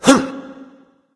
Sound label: grunt jump; smite; voicepack; xbalanque